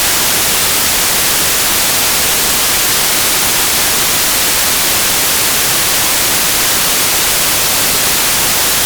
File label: white noise; analog synth 101